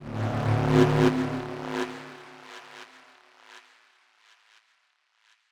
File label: Transition; MatiasMacSD; INFILTRATION